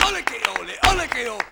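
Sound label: CLAP + VOCAL, MCLAPS+VOX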